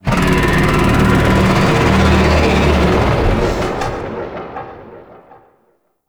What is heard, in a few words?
Void Dragon Vocal Idle; Warframe; Duviri